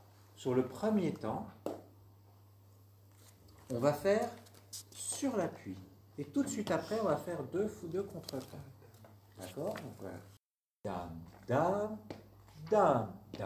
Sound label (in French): rythme